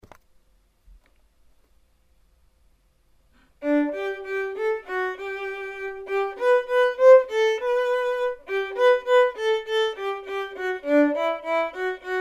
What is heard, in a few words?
vln perf